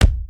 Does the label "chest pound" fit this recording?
Yes